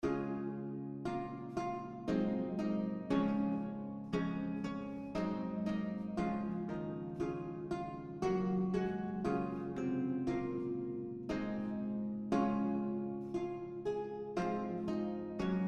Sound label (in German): wiegenlied laute